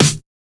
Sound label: Snare; FLPRO; Snares